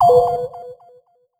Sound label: boot Sound; masked forces macos online wii u windows xbox one gamerip 2018